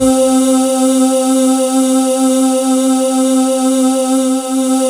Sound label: VOX SOFT C; AMG - Now CD-ROM (Roland); Megabass 1